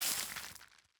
bonemeal; Minecraft

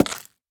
strip, axe; Minecraft

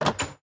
wooden door open; Minecraft

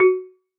note iron xylophone; Minecraft

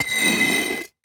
grindstone; Minecraft

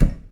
ignite, fire

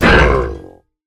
hurt, mob, ravager; Minecraft

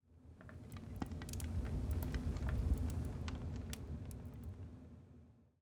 blast furnace; Minecraft

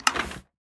loom select pattern; Minecraft

